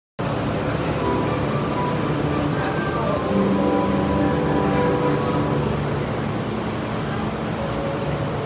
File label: train sounds; 大阪メトロ中央線弁天町東